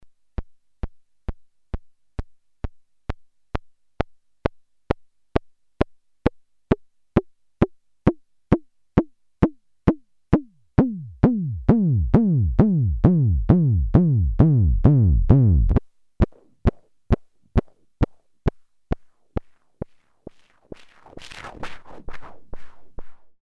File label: Landscape Noon Dual